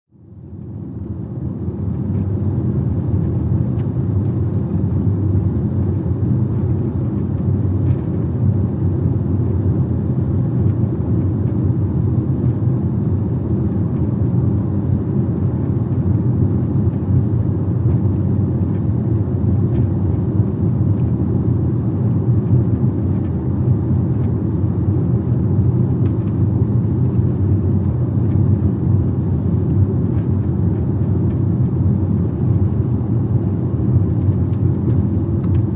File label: Sedan Drive 35m Stop